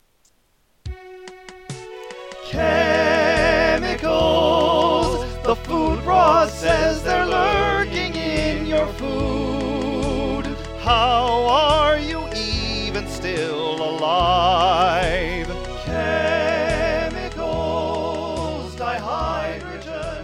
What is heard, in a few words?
Chemical Slow Dance